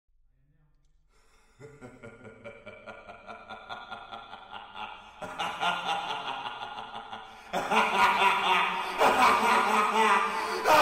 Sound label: Real Joker Laugh